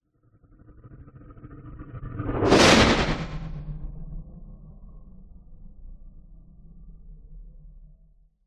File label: sound design sweep stutter growl into metallic sweep and flanged tail; zapsplat